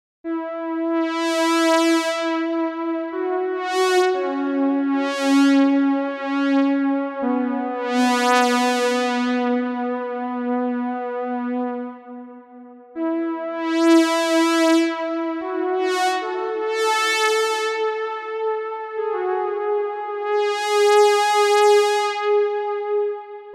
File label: Brass; BladeRunner